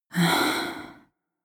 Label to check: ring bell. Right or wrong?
Wrong